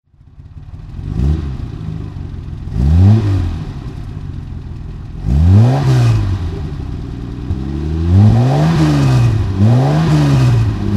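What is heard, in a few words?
jaguar etype 42 2+2 weber throttle, Engine sound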